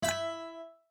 Chip Destroying; My Cute Commissar

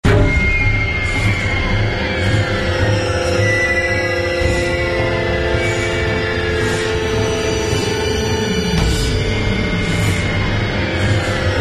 Loop Tense

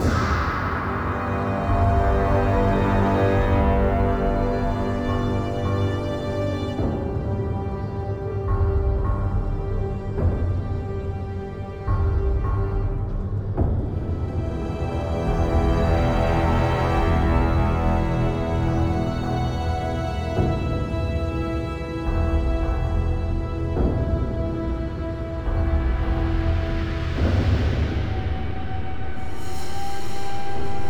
Level Fire (Ambient); Sacred Odyssey Rise of Ayden; android

